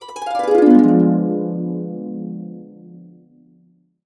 D DIM7, Harps; USB Soundscan vol.62 - Complete Harps; AKAI

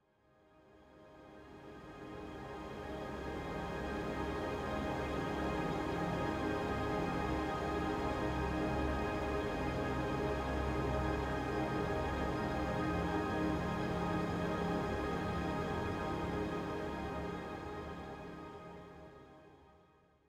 Pads G

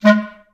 STAC G#2, Orchestra; Best Service; Peter Siedlaczek's Advanced Orchestra

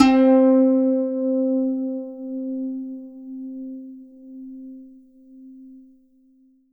zheng c3, ZHENG HARP; World Instruments 2; STRINGED INSTRUMENTS

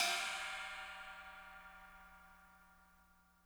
CHINA CYMBAL

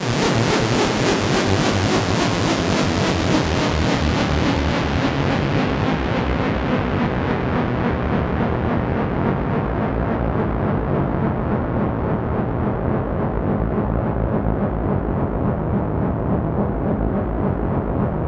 analog, special fx twisted; USB Soundscan; AKAI

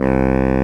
BARISAX C2, SAXOPHONES; Greytsounds VOL-1

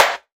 snare, Hardcore Breakbeat; Big Fish Audio; Hardcore Breakbeat Action; Riddim

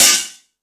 Dance 2000, Drum Machines E-MU Pro-Cussion

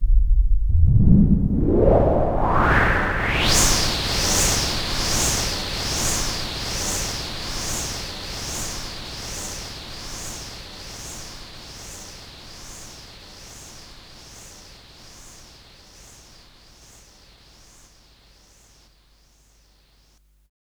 FX, ATTACKS; Best Service Advanced Media Trax Modern Composer

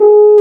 BRS F HRN, French Horn Sh; Roland L-CDX-03 Disk 2; F.Horn 4 Sh

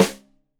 Rim Shot Stereo, drums

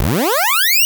NL FX MIX; Masterbits; Vintage Voltage; SQ+FX

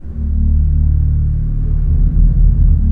DRONE, PipeClusters; E-MU Producer Series Vol. 5 – 3-D Audio Collection; 3DSFX

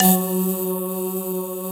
SYN K-2000, Roland

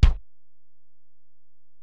Ac BD, Alesis DM-PRO; 300 Drum Machines

